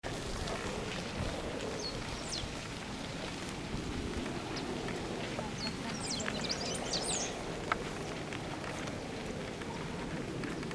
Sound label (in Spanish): verdón